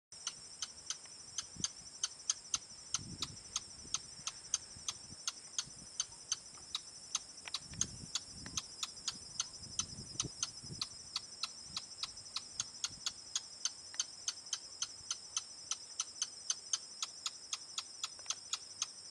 Canto do joao da palha

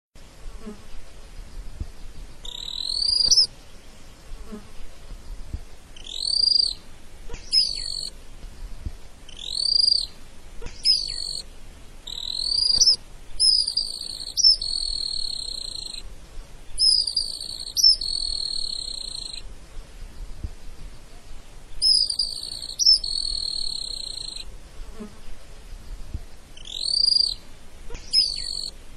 canto do alegrinho do chaco

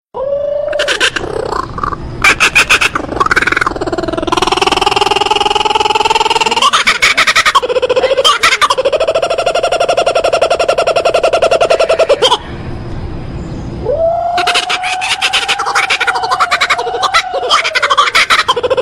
canto da cucaburra de lantejoulas

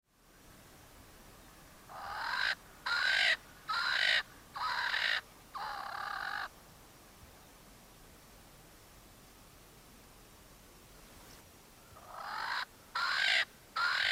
Canto do francolim coqui

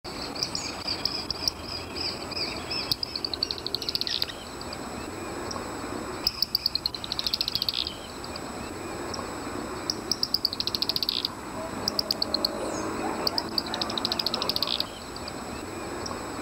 Canto do tesoura do campo

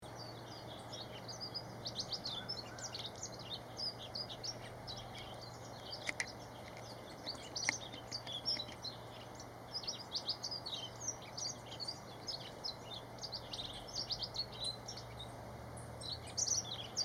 Canto da escrevedeira elegante

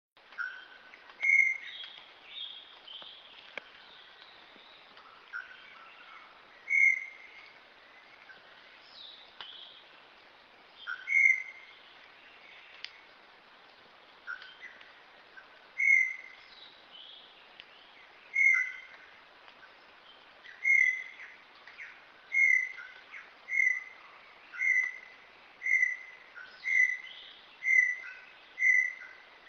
Canto do inhambuguacu

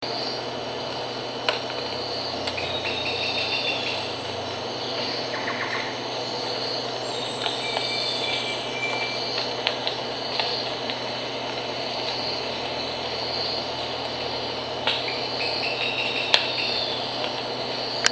canto do ferreirinho de cara branca

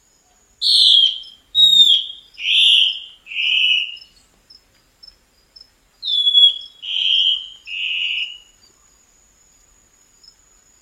canto do mae de taoca

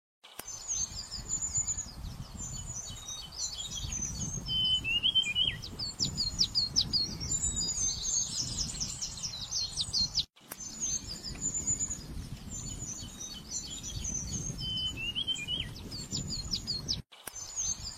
canto do chapim variado

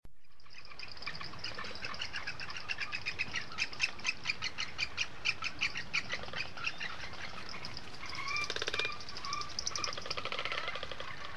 canto da ave do galo da serra

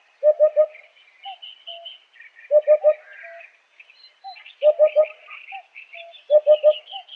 Canto da Poupa

